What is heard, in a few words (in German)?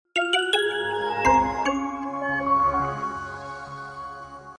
Soundlogo; MVG